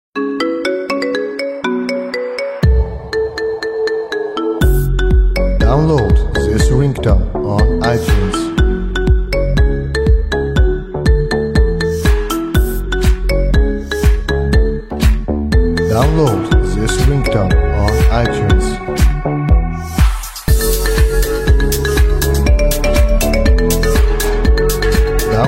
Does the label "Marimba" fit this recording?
Yes